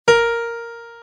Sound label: 3 A#, piano